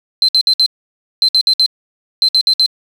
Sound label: alarm; dropchecker